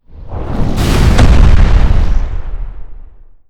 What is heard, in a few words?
world explosion